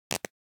Cloth Split Rip Tear - Short - Foley Cloth Movement; Hollywood Edge; sound designer's toolkit v2